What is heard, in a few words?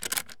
sks slider jam; Escape from Tarkov